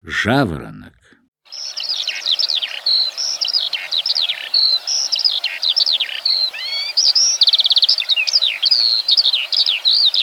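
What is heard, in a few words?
lark; Golosa ptic i zverei; Zhavoronok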